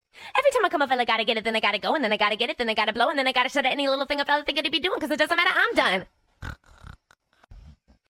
rapping sloth

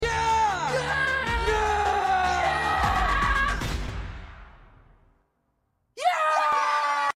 Demon slayers yelling yeahhhh

